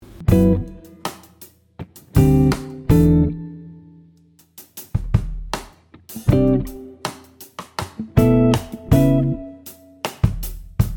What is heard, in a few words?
guitar song